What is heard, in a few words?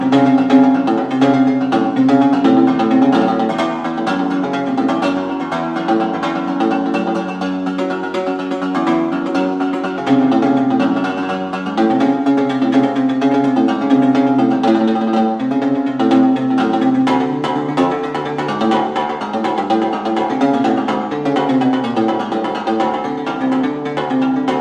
Hungarian Guitar